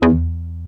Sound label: Arp B E2, ARP Odyssey; synth; musicradar-synth-samples